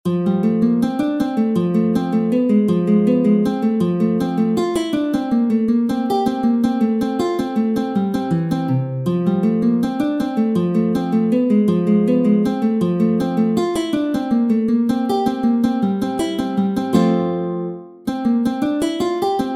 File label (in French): Poco Allegretto, Méthode pour la guitare; Ferdinando Carulli; Op. 241; Classical guitar